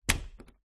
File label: household door internal wood close